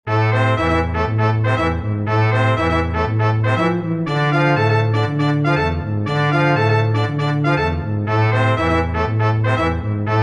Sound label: Afoxe